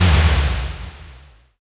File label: hallway tail